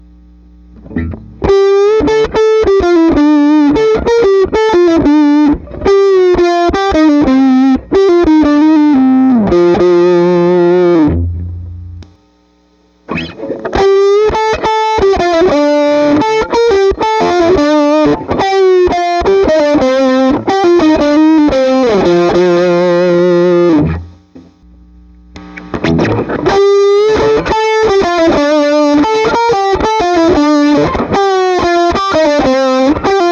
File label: Les Paul Stacked Off 80s 90s Both, Treble Booster; Guild 1984